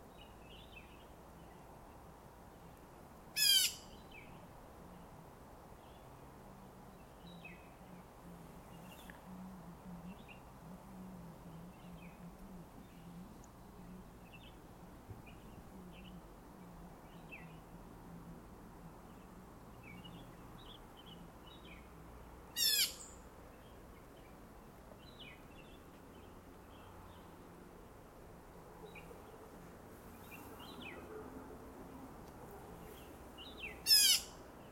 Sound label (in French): Bulbul de Maurice; macchabée trail